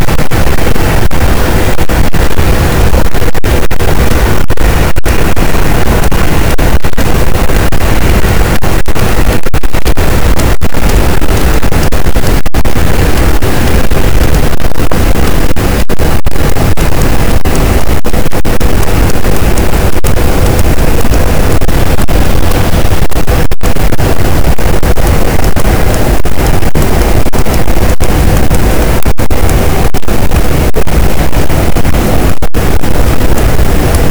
cachalot, Physeter Macrocephalus, gaussian noise

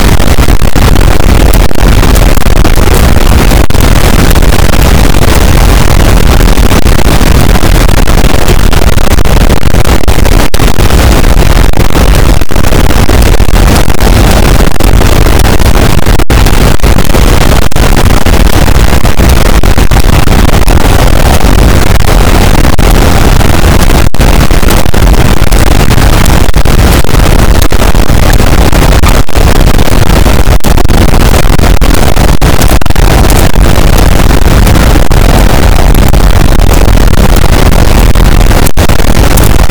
cachalot positif, boat; Physeter Macrocephalus

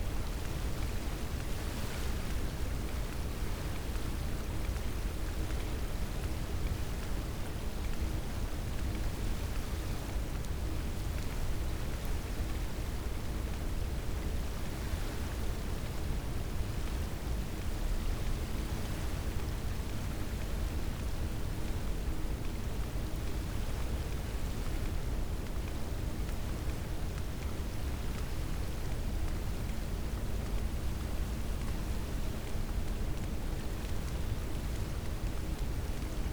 cachalot positif, Physeter Macrocephalus

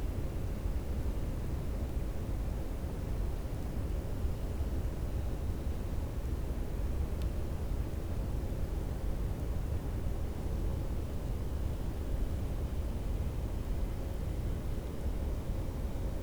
cachalot negatif, Physeter Macrocephalus

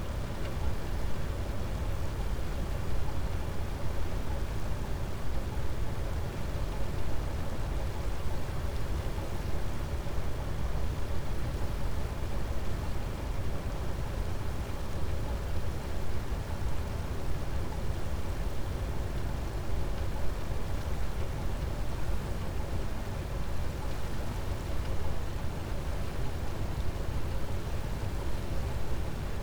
cachalot negatif, Physeter Macrocephalus

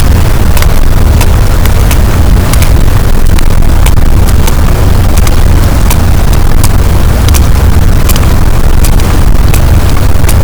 cachalot positif, boat, Physeter Macrocephalus

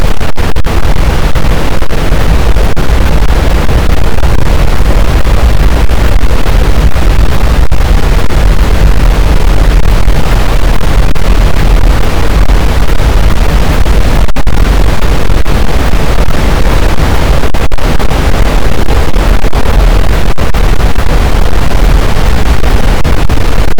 cachalot, boat; Physeter Macrocephalus

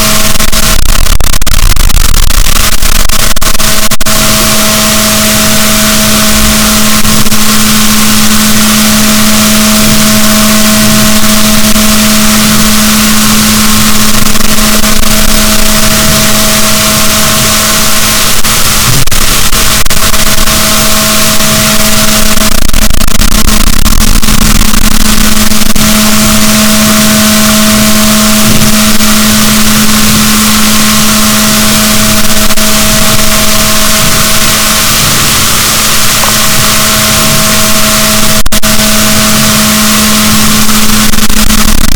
rorquals, boat, Balaenoptera Physalus; SNR 6dB

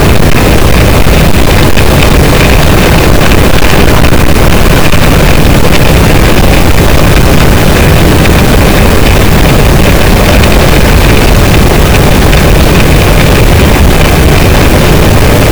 cachalot, gaussian noise; Physeter Macrocephalus

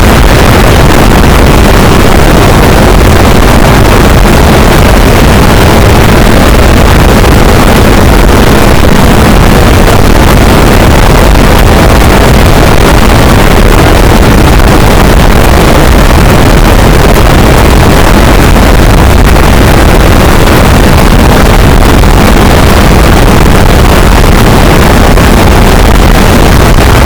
cachalot negatif, boat, Physeter Macrocephalus

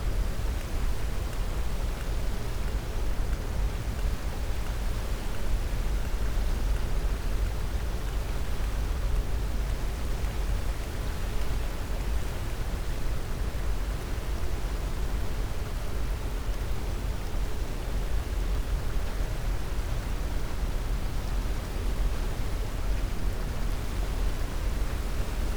cachalot positif, Physeter Macrocephalus

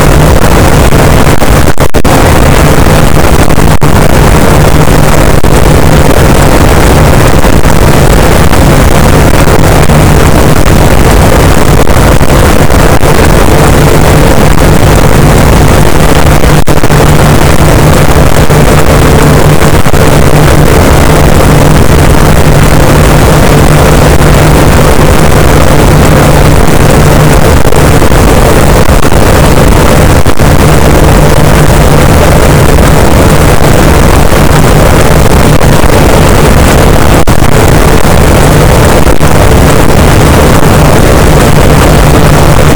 cachalot negatif, boat, Physeter Macrocephalus

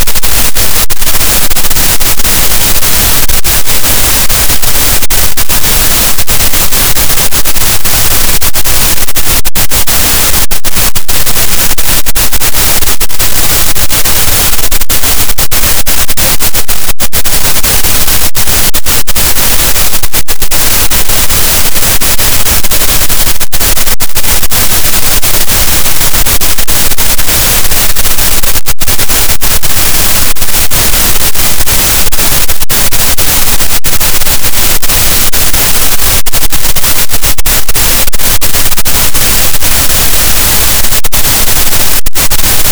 cachalot, Physeter Macrocephalus, gaussian noise

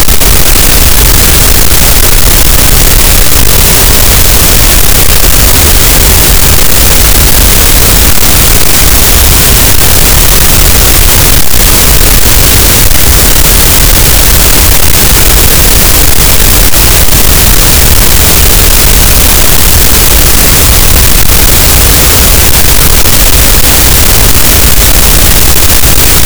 cachalot, Physeter Macrocephalus, gaussian noise

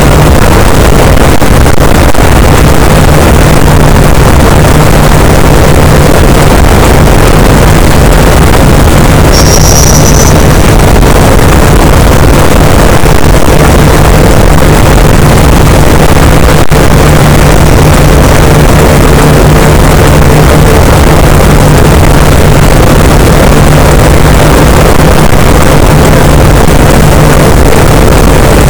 cachalot positif, boat; Physeter Macrocephalus

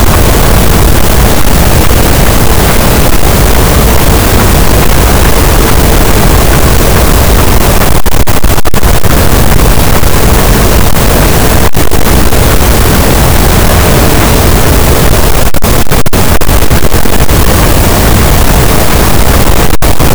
cachalot, gaussian noise; Physeter Macrocephalus

